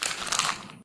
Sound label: paper scrunch